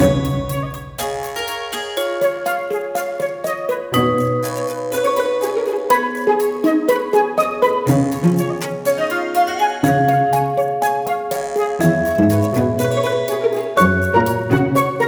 Menu theme; Rescue Tale; Bouncerescue

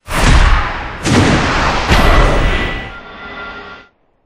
groove

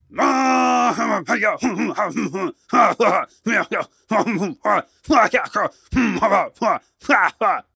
battle attack loop; Zote

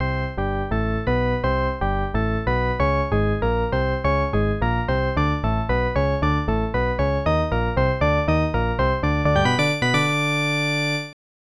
Stadium Organ; ESPN National Hockey Night; snes

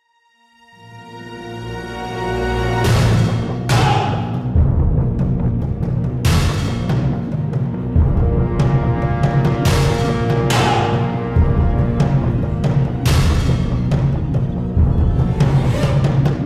Battle Intro; Infinity Blade 2

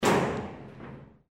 Accordion door closing

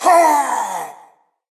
Banner Bearer Cry; Linirea

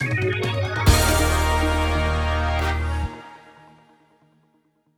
win stinger; mokoko x; Catch me

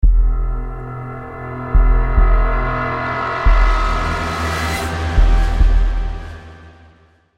Stinger, soundtrack

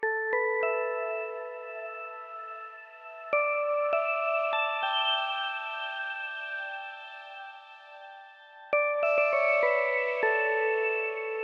Melody Keys; nova 111; ps vita

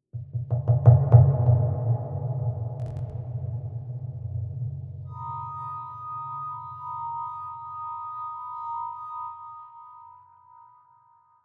Mystery Music One Shot; Battlefield Bad Company 2

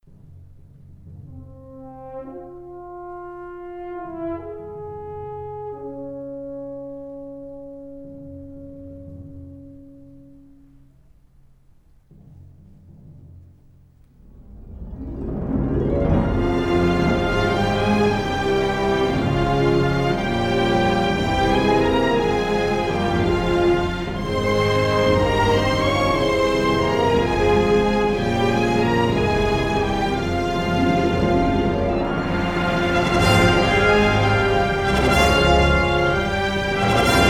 redsquare charge; Call of Duty United Offensive

